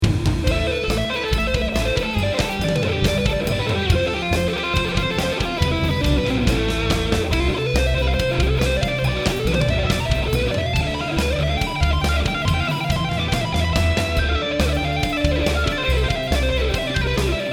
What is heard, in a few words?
solo